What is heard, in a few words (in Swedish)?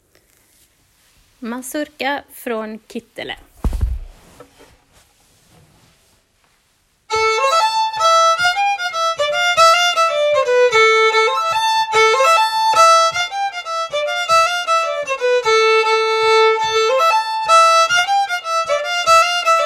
Mazurka från Kittaelae, Finland